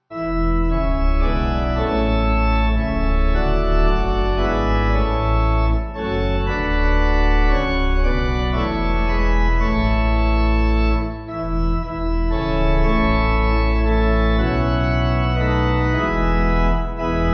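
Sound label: pipe organ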